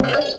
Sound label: down test